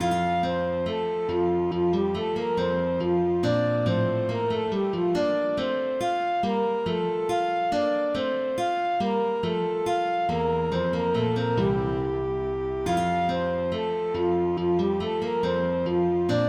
Menuet

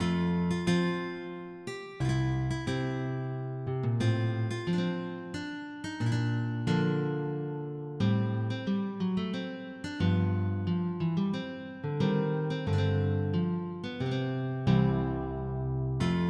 Sarabande, MIDI

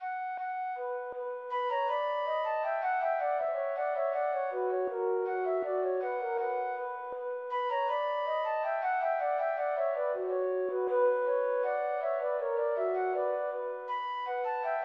Rondeau Gay

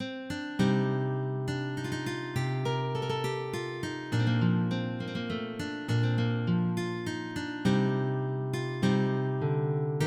Passinmesers gaillard, MIDI

